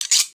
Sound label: metal